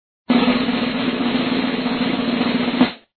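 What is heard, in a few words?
barabannaia drob, drum roll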